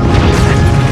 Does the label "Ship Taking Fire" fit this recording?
Yes